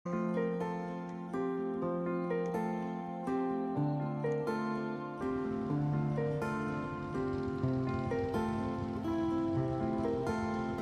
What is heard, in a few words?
heartwarming music